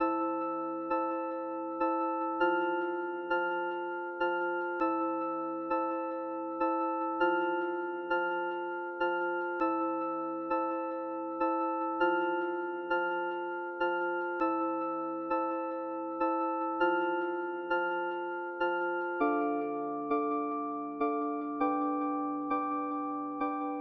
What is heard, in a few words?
Keys High